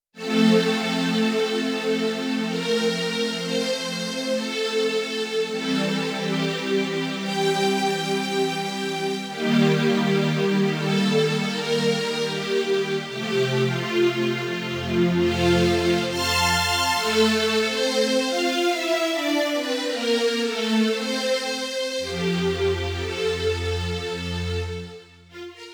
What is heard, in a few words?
Strings, Korg Radias-R